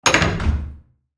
Old wooden door opening; household

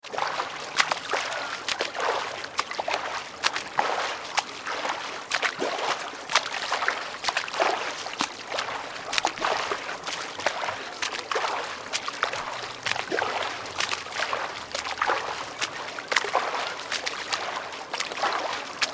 Swimming in pool freestyle stroke with breathing

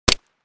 Beretta handgun single gunshot suppressed

